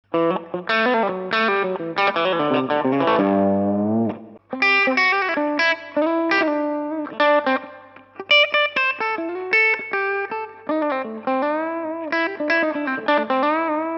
steg knaggs clean

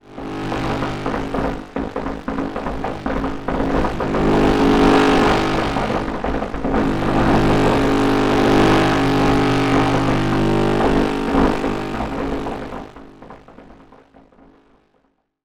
Soma Ornament 8 steuert MI RINGS

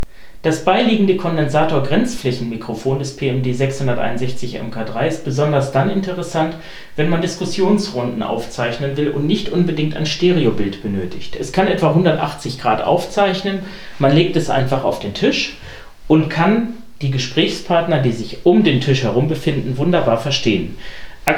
Marantz Grenzflaechenmikrofon

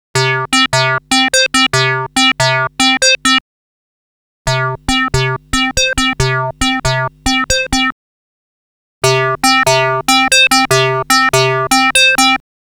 vergleich ringmod

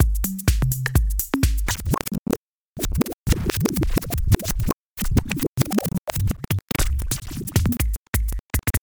Spulen; Mixxx

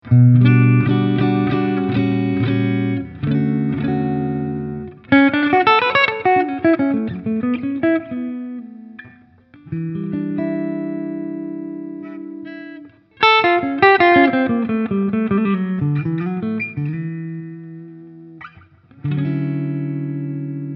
PRS hals clean jazzy

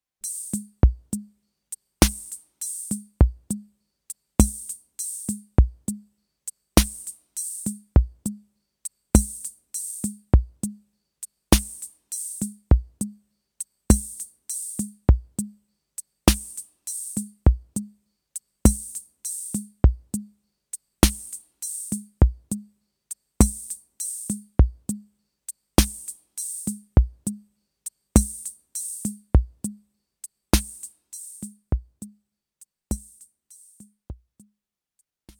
Roland CR 78 Disco 2 mit programmierter Snare

